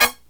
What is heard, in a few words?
HIGH HIT; USB Soundscan vol.29 - Killer Brass Riffs; AKAI; HIGHHITS